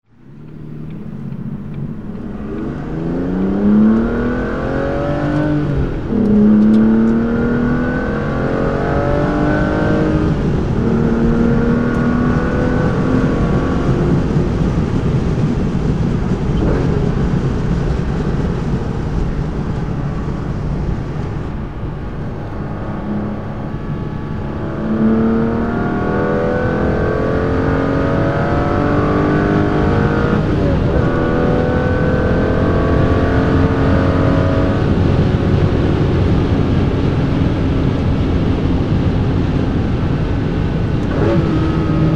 Vanquish - a bord, aston martin

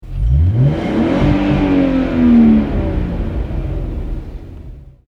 Golf R32 Motor, volkswagen

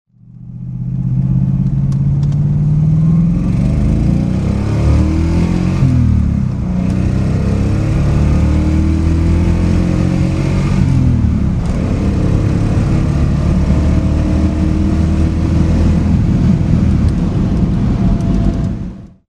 DeTomaso Pantera GTS acceleration, engine sounds

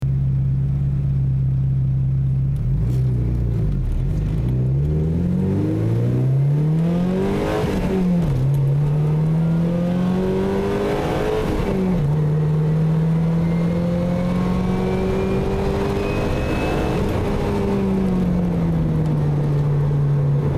944 TurboCup accel int, engine sounds; porsche

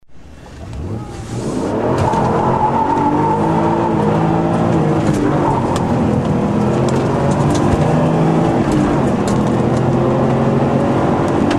Supra, engine sounds; toyota

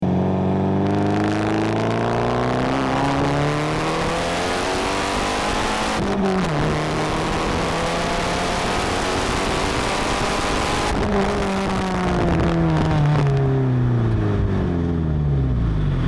944 Turbo Cup accel ext; porsche

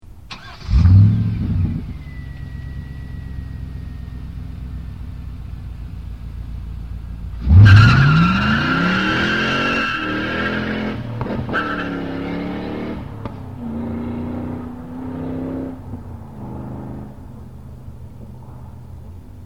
Corvette C5 - acceleration

